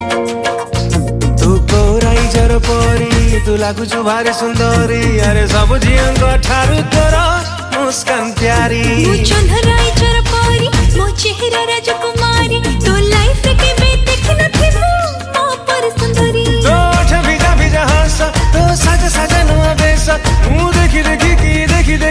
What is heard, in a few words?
romantic ringtone